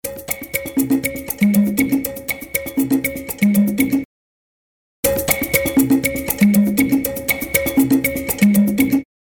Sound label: loop